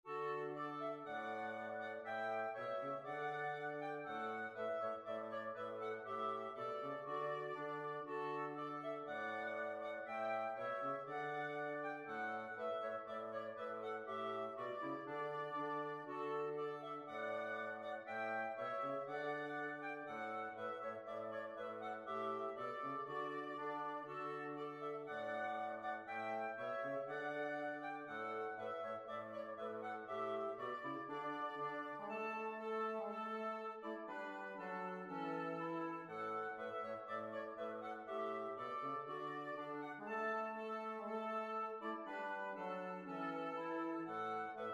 Kings Polka, big band

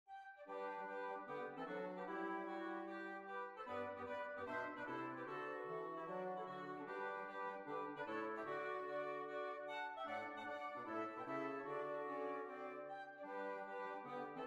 Kirkgate Hornpipe

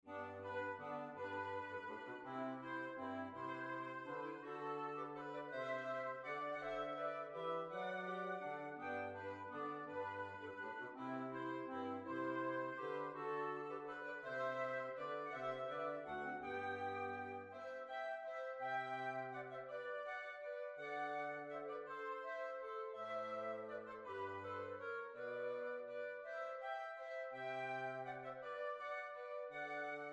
Waltz

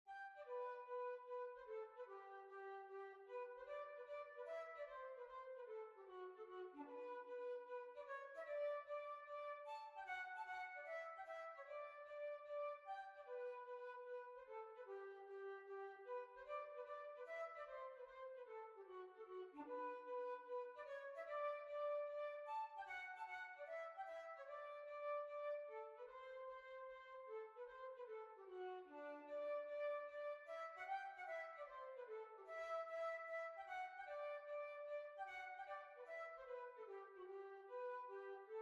Kirkgate Hornpipe

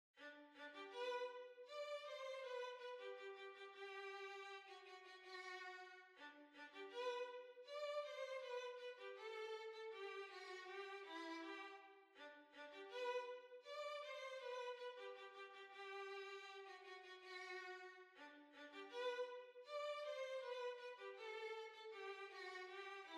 Hornpipe, Violin

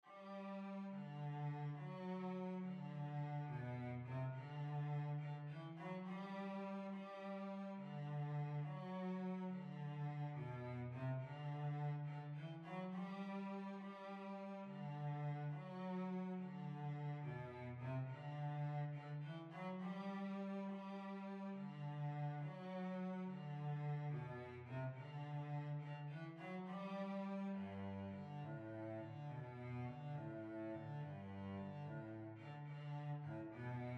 Violoncello

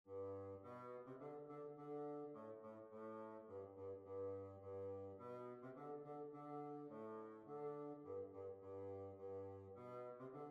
Polka

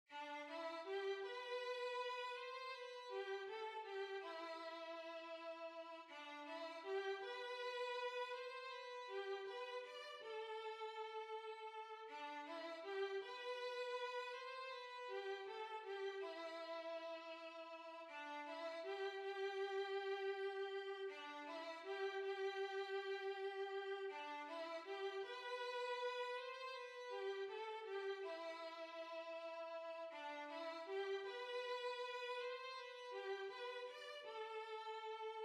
Violin

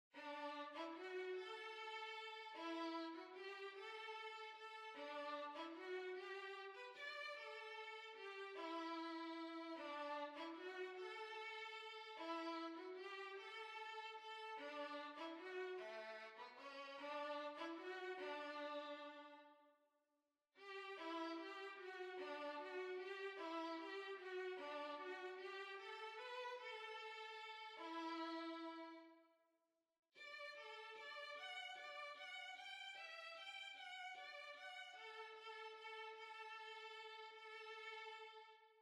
Violin

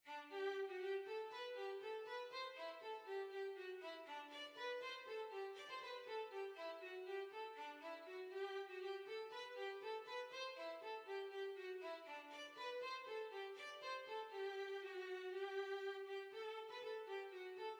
Violin

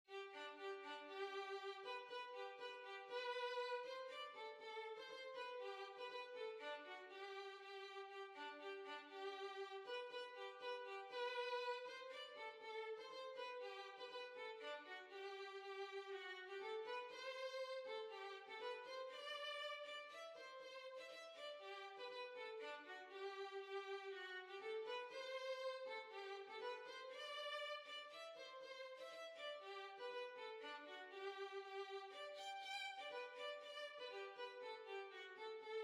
Skotsk, Violin

